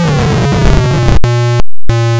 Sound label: GAME OVER; Shadow Knights